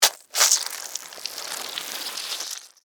Mosquito Bite, Animal, Mosquito, Bite, Suck, Blood, Insect, Bug; Mosquitoes